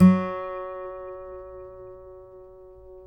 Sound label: F#, guitars; Spectrasonics; Hans Zimmer Guitars VOL-2; FNGSTYLE PFM